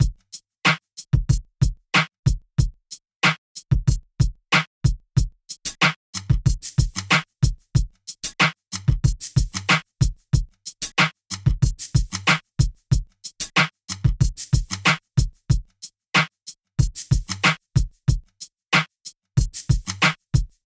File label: Snare pop drum loop